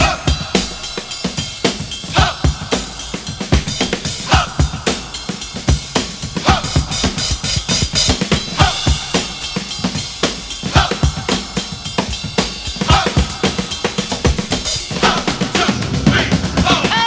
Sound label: 112 bpm drum groove c key, Free drum loop - kick tuned to the C note. Loudest frequency: 2605Hz